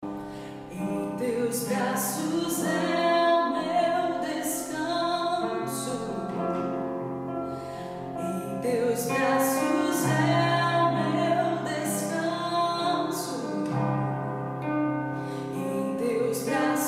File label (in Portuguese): contralto